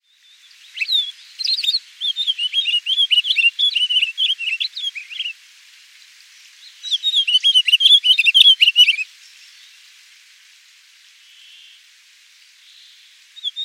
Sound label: Pine Grosbeak, Pinicola enucleator; cardinals tanagers grosbeaks